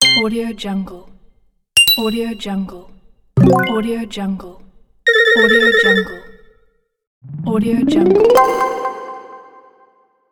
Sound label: ui menu sounds